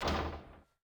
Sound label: reel Bounce